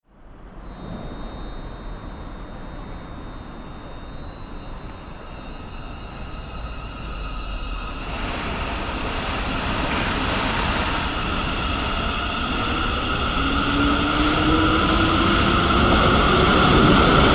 Train Arrival, Sound of an electric train arriving at station platform.; Trains; Vehicles